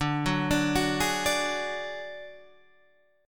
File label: D Major Flat 5th D Guitar Standard, chord; arpeggiated; JGuitar